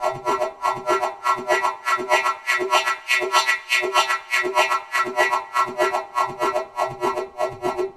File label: Echo Chuff, Synthetic Pulses